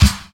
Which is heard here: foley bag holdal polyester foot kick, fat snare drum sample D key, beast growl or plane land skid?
fat snare drum sample D key